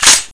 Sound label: mp5 bolt slap; weapons